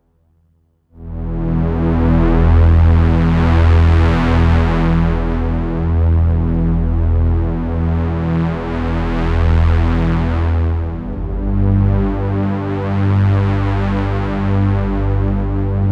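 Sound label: SE50 80ies Keyboard Multi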